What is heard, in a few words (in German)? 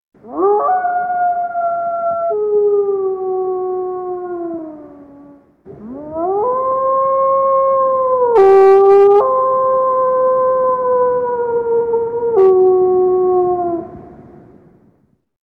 Wolfheulen; Tiere